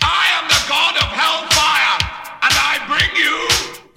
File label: hellfire, audioloops